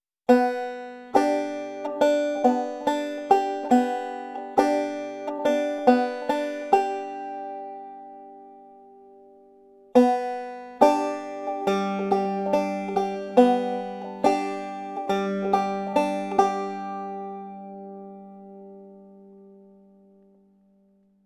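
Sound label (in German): kombination pinch und alternate picking, banjo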